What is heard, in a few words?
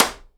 SIDE STICK, Live Snares; PlatinumPhattCD1; E-MU Producer Series Vol. 8 – Platinum Phatt